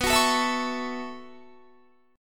B 9th Suspended 4th B down Guitar; strummed

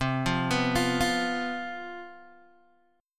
C Major 7th Suspended 4th, down, Guitar, Open G

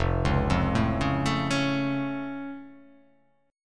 F Augmented F

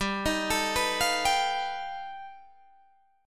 G Augmented 9th, Guitar, Open D

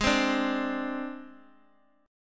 G sharp Major Flat 5th, Guitar DADGAD

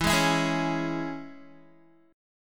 E 6th, down, Guitar; strummed

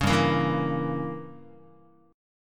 G Diminished 7th Guitar, Gdim7 chord; strummed; JGuitar

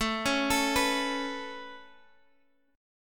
B 7th Suspended 2nd A

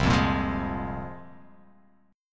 C 7th Suspended 4th Sharp 5th, C7sus4#5 chord; C7sus4#5 strummed

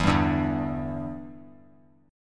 E Minor 7th, down; strummed

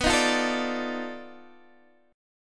B 7th Sharp 9th Flat 5th, down, Guitar, Open D

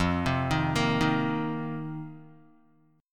F 7th Suspended 4th F down Guitar; arpeggiated; JGuitar

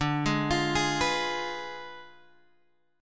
D Diminished 7th D down Guitar Open F; Ddim7 arpeggiated

